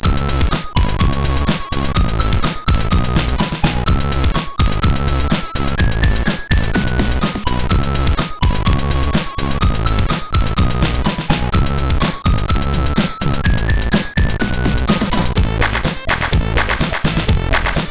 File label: Mamba Tune plus Digi, MOS6581R4